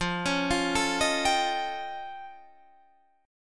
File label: F Augmented 9th F Guitar Open D, chord